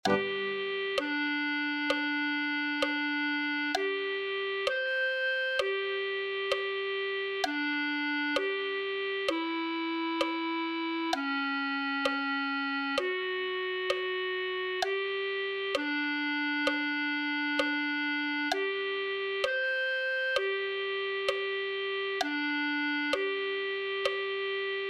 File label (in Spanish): clarinet1